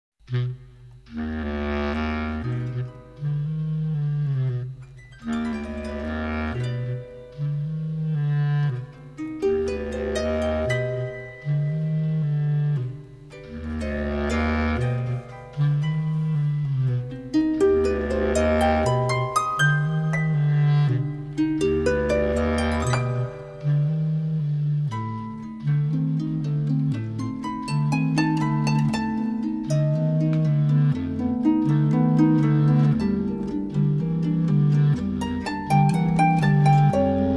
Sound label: Tango Lyrico